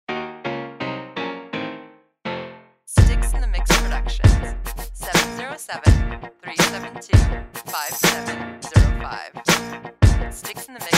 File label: Mute Triplets